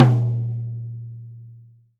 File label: Tom Hardest; hydrogen drum samples